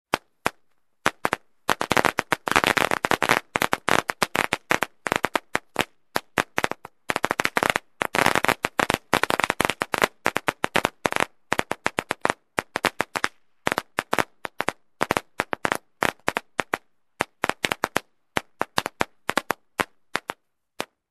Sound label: Firecrackers